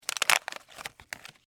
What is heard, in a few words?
Package Open Small, Foley, Package, Open, Small, Plastic; Plastic Sound Effects; Plastic (Sound Effects:Materials); BlastWave